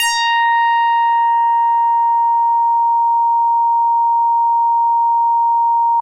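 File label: Synthe Bass DX100 A#6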